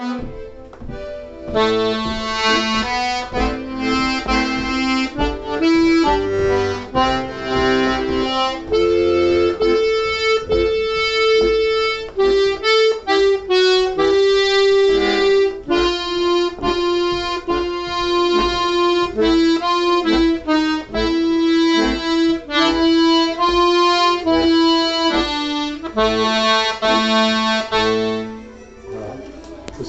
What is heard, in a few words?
Polka